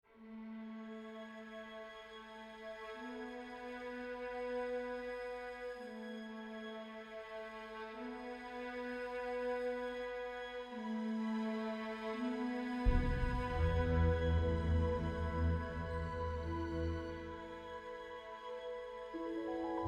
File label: World Music